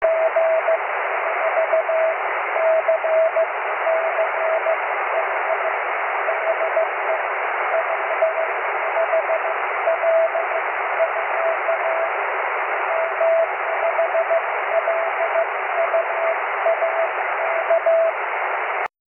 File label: cw, 23cm; w5lua